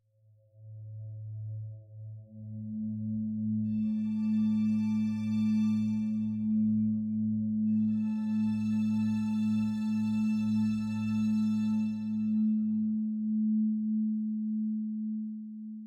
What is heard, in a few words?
ambiant; Quivr; PS4 Windows gamerip 2016